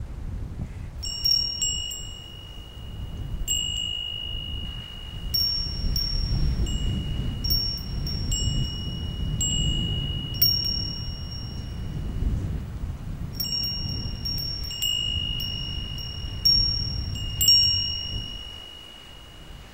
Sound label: Ambient Wind Chime; ffxiv; Orchestrion